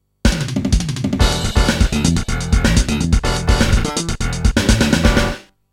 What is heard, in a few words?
Round Clear; galaxy force ii thunder blade original sound track